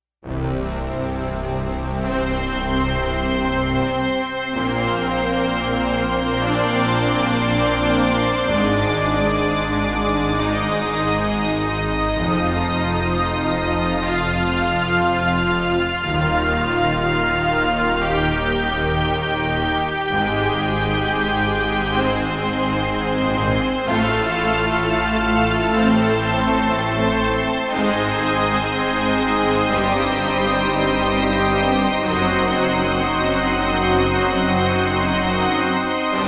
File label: FILM SCORE